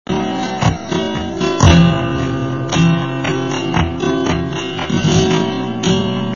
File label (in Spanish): buleria SLOW